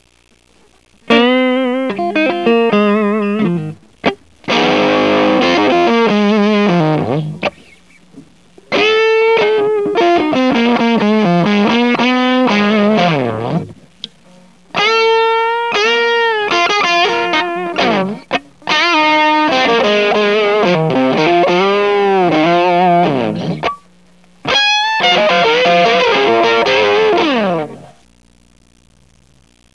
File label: mosrite fuzzrite strat